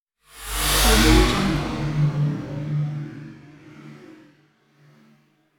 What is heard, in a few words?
heavy deep air stinger; hollywoodedge